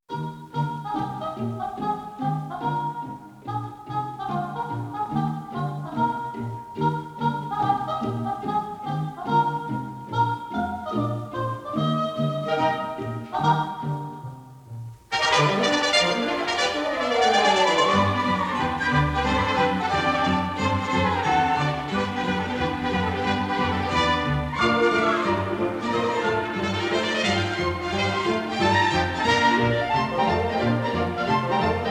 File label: Turn on the Old Music Box